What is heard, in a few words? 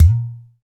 Udu Pot Hi, percussion; Roland BK-5